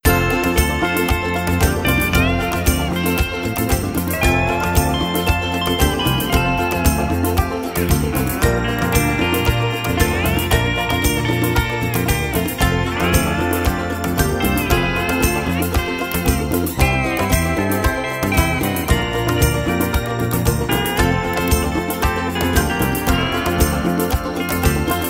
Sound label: Music